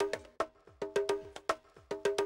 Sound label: 110, Classic Drum Loops